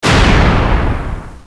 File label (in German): Kanone; Anno